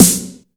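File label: EFEX snare drum, FX DRUM; AKAI S6000 CD-ROM - Volume 1; DRUM RYTHM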